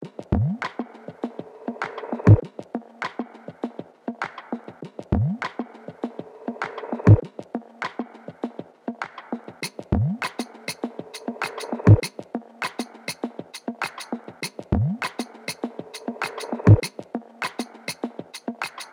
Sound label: Percussion Loop - 100 BPM; Orchid; Percussion Loops